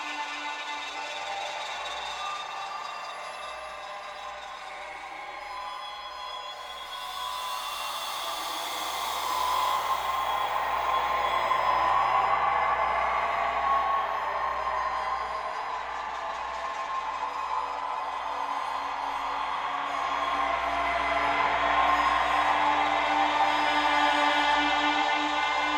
Mine Tension; Outlast 2; PS4 Windows Xbox One

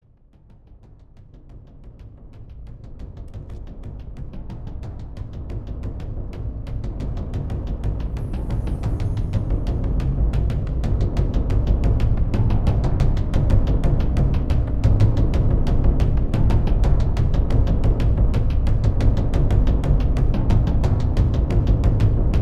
Ritual - Rada Drums; Gabriel Knight

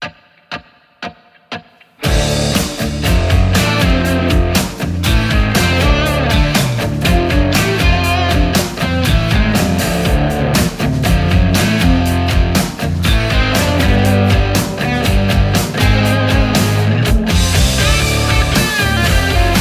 Rock; Chopper; Farming Simulator 19

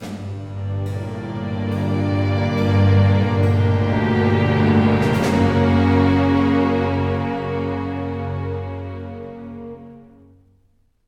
String; Assassin's Creed Unity Dead Kings

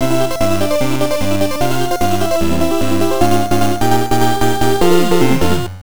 Bonus Stage Clear; galaga 88 tg 16

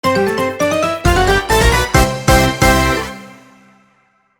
Minigame Victory; Mario Party 9 & 10

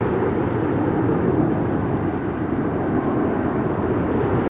Fire; Learn to Fly 3

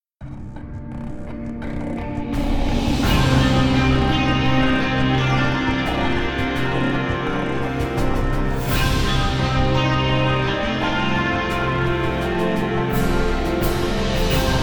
Music Dark Victory No Lead Guit; starcraft 2 wings of liberty; MissionMusic

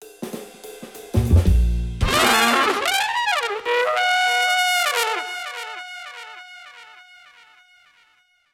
Trumpet; Paper Mario Sticker Star; Thing Sticker

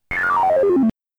Item Dropped; Donkey Kong Jr.; arcade

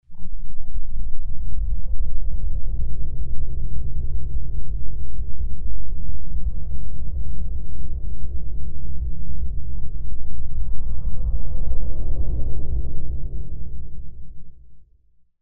ambient suspens; Necrovision; ambient #4

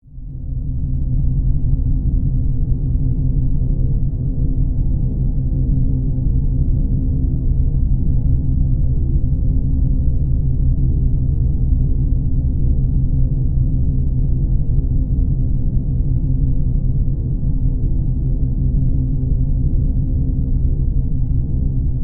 Door Breach Entry; medal of honor warfighter

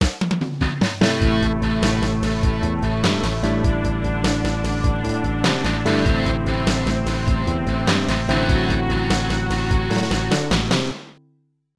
Continue ~ Game Over; King of Fighters 2002 Challenge to Ultimate Battle; Neo Geo; arcade

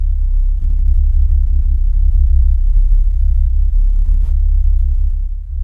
MUS ABYSS DOOR

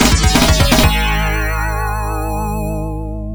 Victory; Mortal Kombat; Genesis; Hall

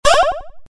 armor; Robot Wants Ice Cream

